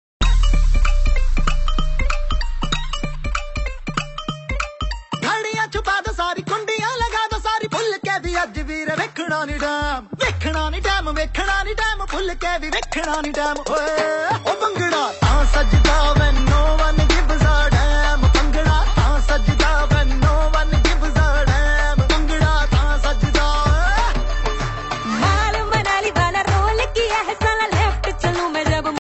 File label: bhangra ringtone; veere di wedding; bollywood